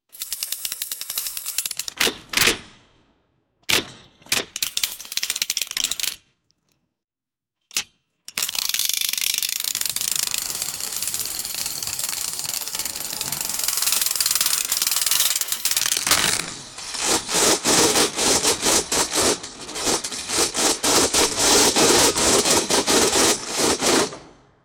gear guts

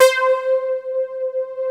POLY SOFTS; Pro-Rec - Synthsonic

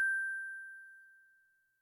Celesta, Yamaha DTXpress

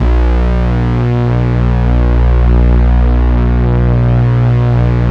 SYNC DEEP, PADS; Masterbits; Vintage Voltage

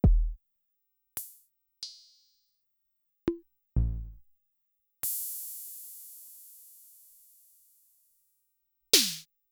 Pop; Sonic-Potions LXR

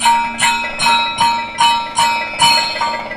KATAK, Indian Traditions; USB Soundscan vol.45 - Indian Traditions; AKAI

